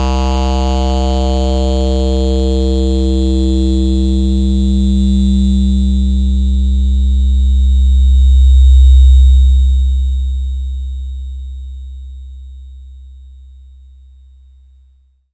bass note emx 8 Bit; Basses

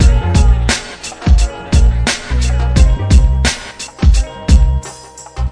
087 bpm the real hip hop, drum loops and breaks

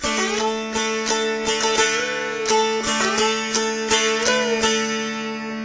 085 fretless dulcimer; GUITAR LOOPS

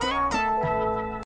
disco synth stab; Melodic Stabs and Hits

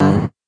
fifth shot, percussive and bips and clicks; REMIXABLE COLLECTIONS; Ze Franks Pain Pack

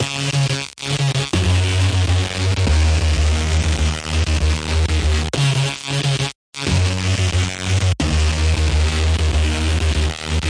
destroy machine bassline; MELODIC SAMPLES

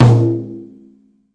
pearl kit hi tom; REAL LIVE KITS

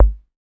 Yamaha TX-81Z BD; drum machines

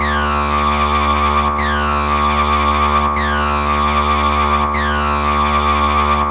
076 verby alarm bass; SYNTH AND ELECTRONIC LOOPS

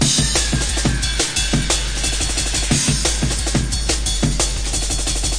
178 skiing dnb, drum loops and breaks; 161 to 180 bpm

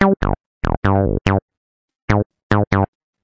crunchy synthline; SYNTH AND ELECTRONIC LOOPS

